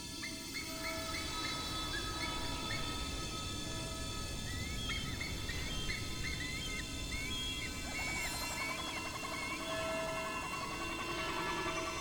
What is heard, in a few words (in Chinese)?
光明神殿音效; 2021西路背景音效